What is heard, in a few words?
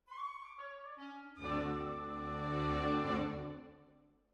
Hall